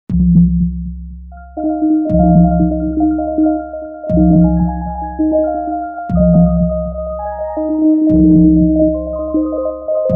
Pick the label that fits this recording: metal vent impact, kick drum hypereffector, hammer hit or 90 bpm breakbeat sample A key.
kick drum hypereffector